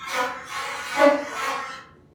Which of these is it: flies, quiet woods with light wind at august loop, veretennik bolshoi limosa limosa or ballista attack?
flies